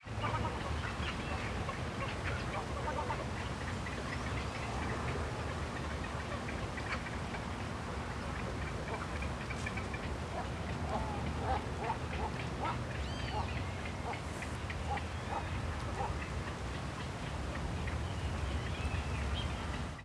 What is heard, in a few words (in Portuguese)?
Patos e Gansos Andando Proximo ao Lago do Parque da Cidade de Brasilia; aves